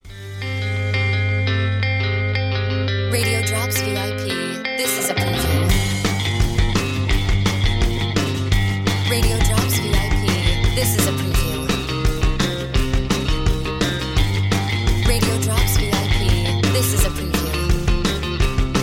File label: Music Bed